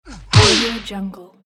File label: fight kick